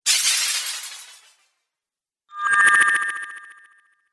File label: Magic Spells; Magic Sound Effects